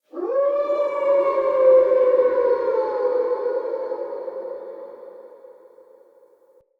Quest Wait Howl Hint, Wolf; Animals; Orengall

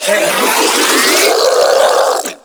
Infested Ancient Melee Mid Spin Scream

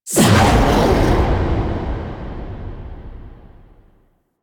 Fight Stage End Stinger; Warframe; Duviri; Kullervo Encounter

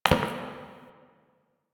Idle Single Step; Tagfer

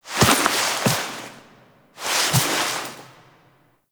Epilogue Foley; Zariman; Cinematics

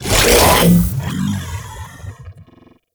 Devour Impact; Warframe; Grendel; Grendel Deluxe

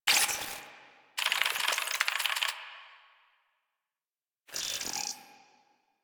Reactor Death Intro; Warframe; NineteenNinetyNine; CharacterIntro

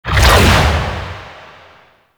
Charge; Warframe; Infested Lich; Hard Drive

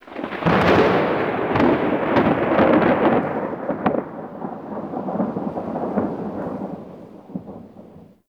thunder crack; Warframe; Tau

